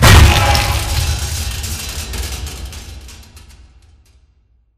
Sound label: vent fan death; beacon; Titanfall 2